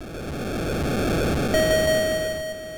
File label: GAME START; Fight Night; Atari 7800